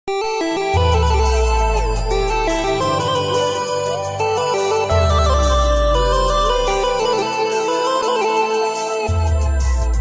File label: Gujarati Ringtone